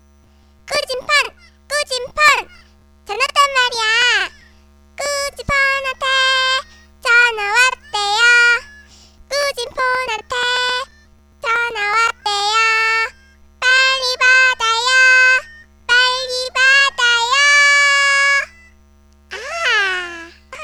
Cute Korean Bell

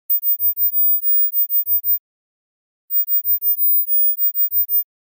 Sms 1000 Mhz

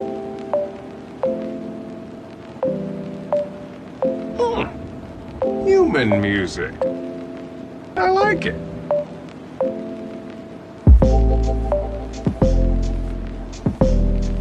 Human music

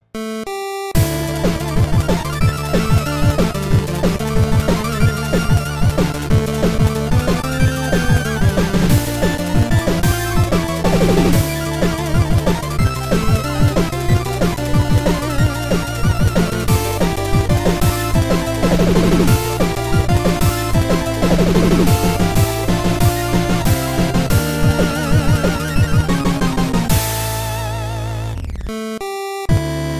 8bit; GoldenWind; Jojo

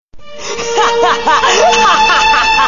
Laugh

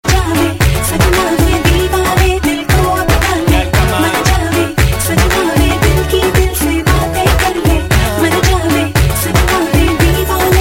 Indi Pop Music

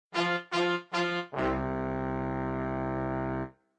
Funny Ringtone

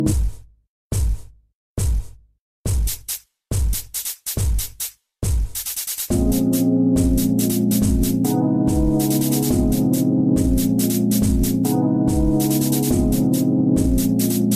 Nokia Elect Mix

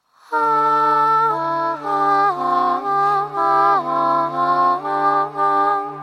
vocal; Spice and wolf

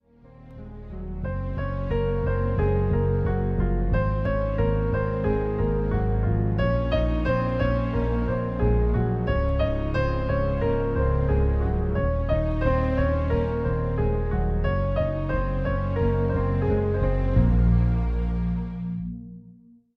sad song

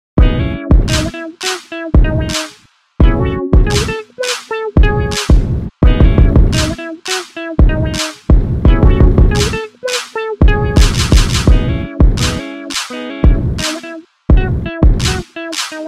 Reinstrumental

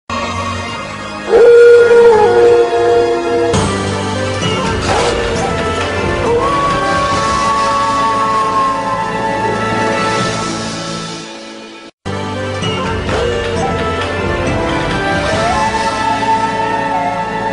Howls; Okami

